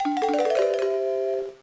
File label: Unknown Jingle; mario tennis power tour